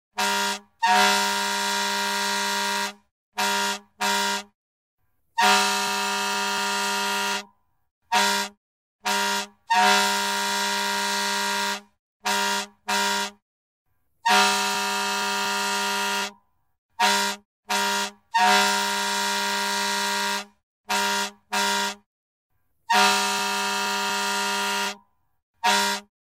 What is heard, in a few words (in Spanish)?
claxon de camion